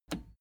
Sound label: plastic button scratch smooth push disable